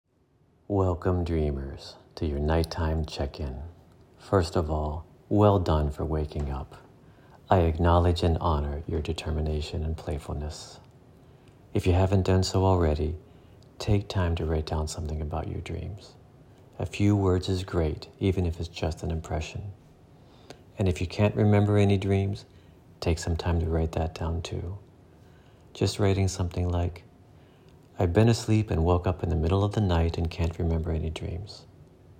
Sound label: Circle lullaby